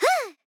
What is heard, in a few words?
Fairy Grunt Attack